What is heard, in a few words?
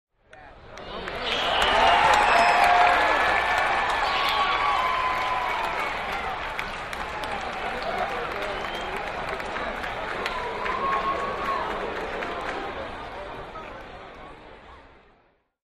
Large Baseball Crowd